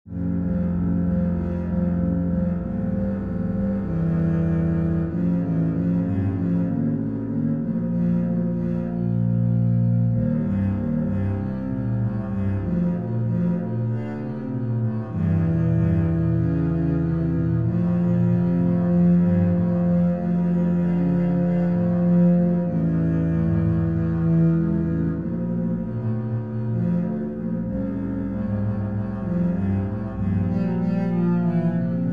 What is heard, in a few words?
bass f major scale duet